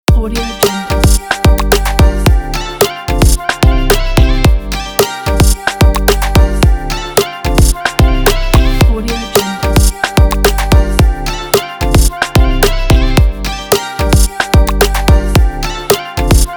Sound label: summer pop loop